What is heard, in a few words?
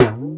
talking drum; Greytsounds; EXOTIC PERC